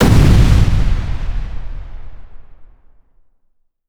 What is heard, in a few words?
lizard blob impact; weapons; dota; snapfire